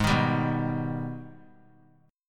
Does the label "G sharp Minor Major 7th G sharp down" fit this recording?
Yes